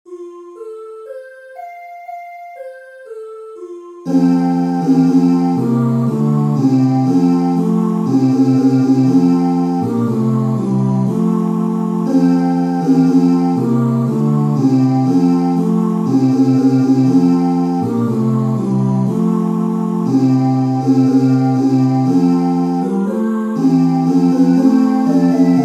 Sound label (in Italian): tenori; deck the hall; progetto voci ditalia